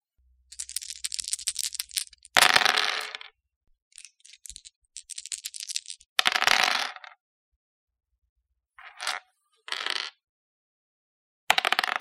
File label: dice